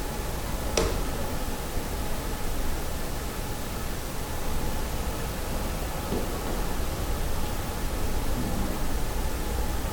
Colpo vicino microfono presunti passi lamento; Castello Marostica

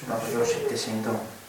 respiro sospiro; Bogoni

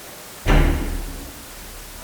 Colpo; Forte Belvedere

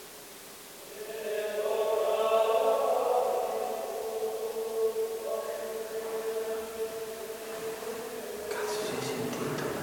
Cigolio porta; durante spunto quantico; AbbaziaVangadizza

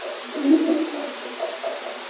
Vocalizzo femminile

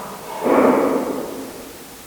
Botta; Forte Belvedere

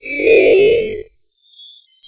urlo; Castello Monselice

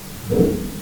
Colpo; VillaMussolini

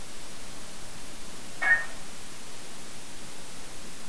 terzo colpo; Castello Monselice

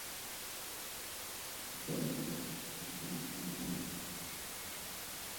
Rumori; Abbazia Vangadizza

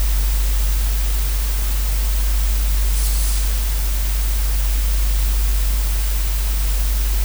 Strano Fruscio

